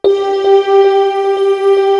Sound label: HYPER B, BELLS; East West - Global Sounds